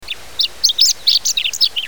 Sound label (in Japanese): houaka